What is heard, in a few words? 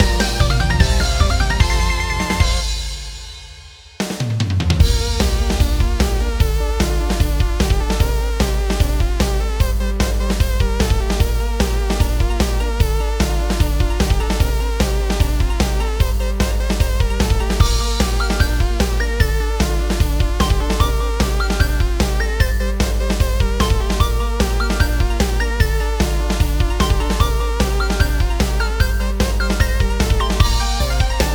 Winning Music; supersonic tank cats; switch windows